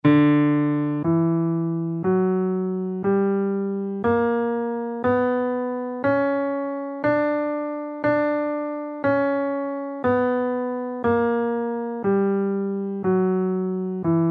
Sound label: ionian augmented scale in D, guitar